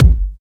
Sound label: Dubstep Kicks